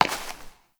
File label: Step Ice; Warframe; Koumei